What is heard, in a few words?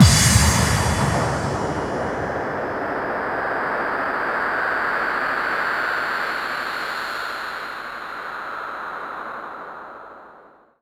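bass drum FX, dance drums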